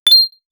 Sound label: system response high pitc